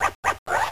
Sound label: scratch